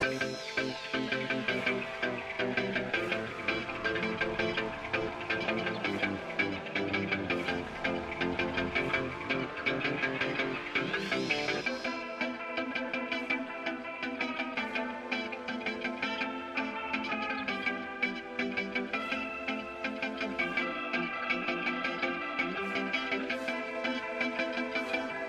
Melody; PixelJunk Shooter; PS3

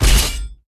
Interface

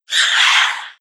Hiss Beast Screech; Power Drill Massacre

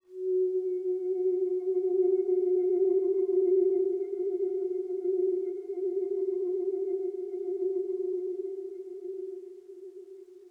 Oneshot Synth; Zombie Army 4 Dead War

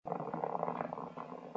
Roll Wood Stone

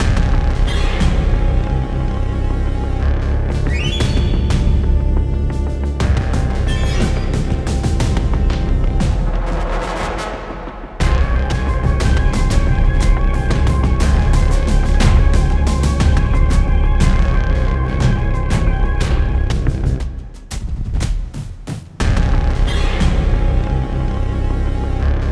Nighthunter Near Intro; dying light gamerip 2015

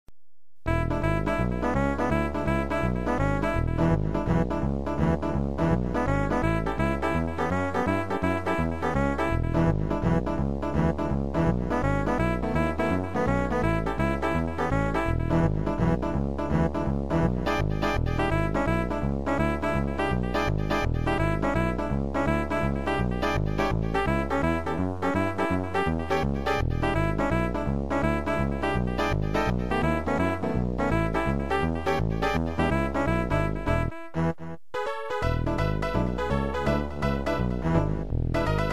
Rock n Roll; Wizkid The Story of Wizball II; Amiga

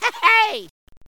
Yeah Squeak; Garfield Kart

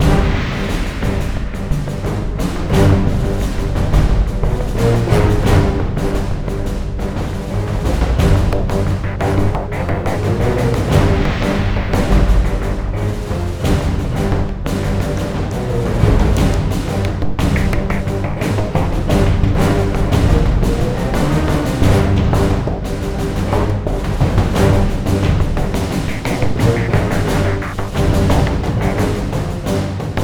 Rail Shooter Idle; modern combat sandstorm

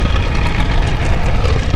Move Rocks Rotation

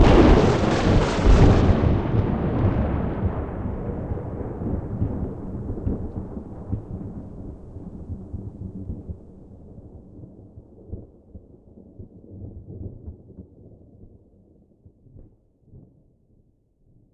thunder long

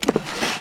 Diary Close

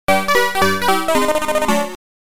Lose Life; Mappy; Sharp X1

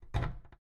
Door Close; miside demo windows gamerip 2023

